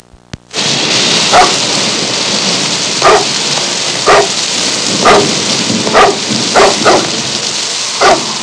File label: Dog barking in thunderstorm